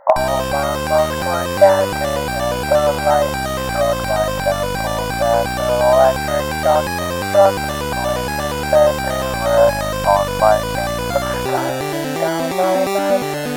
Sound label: chiptune